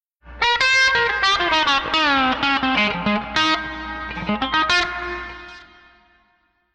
Minor Down, pedal steel

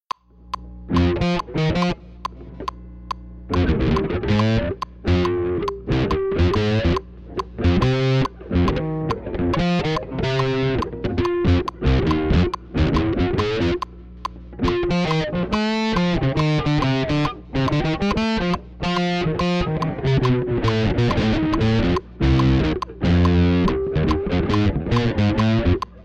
Pedal Steel Bass

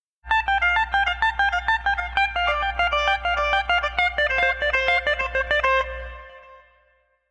Horse, pedal steel

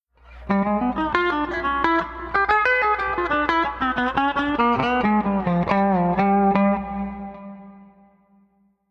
pedal steel

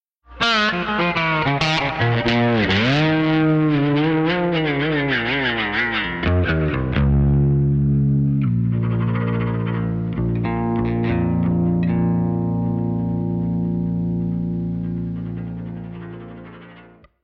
Rock Run, pedal steel

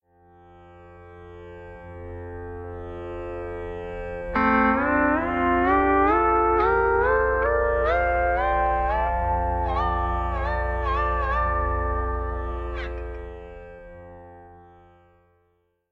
Sustain Up drone, pedal steel

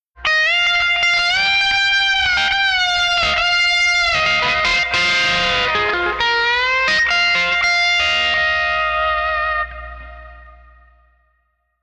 pedal steel

